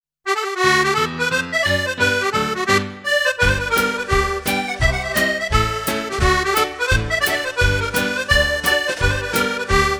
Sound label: polka